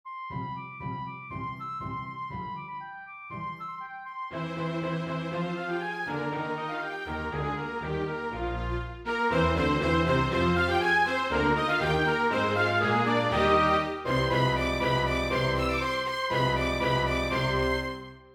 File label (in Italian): Rondo per un Concerto in mi bemolle maggiore orchestrato